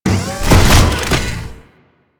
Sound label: Drone Open; Warframe; Venus; Venus Turrets; Venus Auto Agent Drone Turret